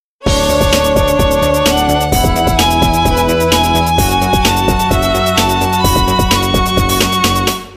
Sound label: violin